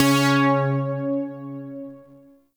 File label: Shawzin Oct Two C Sharp; Warframe; Zariman Shawzin